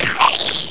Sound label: vort pain; hl2